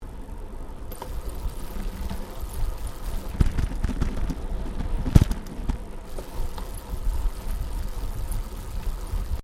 Bicycle Riding Tramline Crossing Stereo, 21 speed road bicycle; Giant Crx3; Bicycles